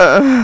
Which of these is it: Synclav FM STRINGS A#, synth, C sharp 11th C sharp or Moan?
Moan